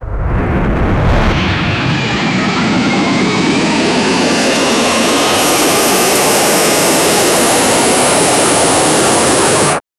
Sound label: launch of a jet powered, aerospace